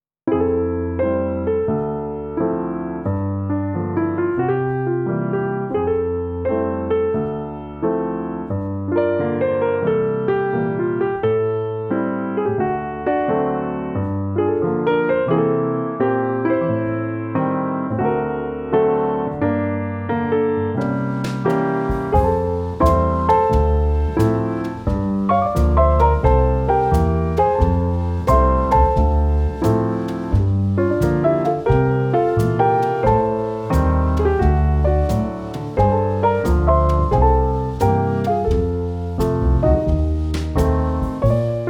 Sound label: Rainy Day Jazz; supermarket simulator